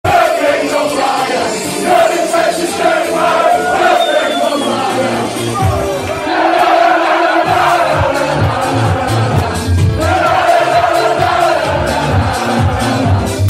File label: on fire wigan chant; will griggs